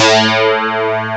ENSEMBLE; CMI Sound Library Rev 1.3

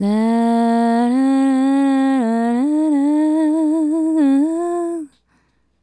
Female Solo, Hip-Hop and RnB Vocals

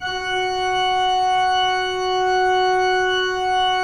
PIPE, 3DOrgans

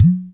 Udu Low Hit; Sonic Foundry (Sony Creative Software); Joe Vitale Latin Percussion

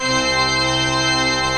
PIPE ORGAN

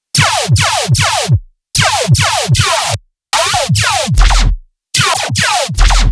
BASS SHOT

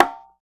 Conga Slap; Roland BK-5; Percussion

